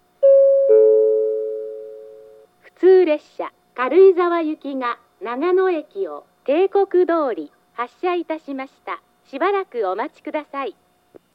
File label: amori local, station; jr east; karuizawa